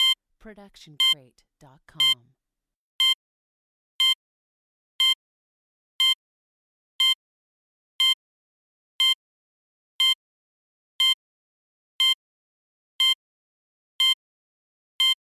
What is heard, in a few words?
Bomb Timer Beeps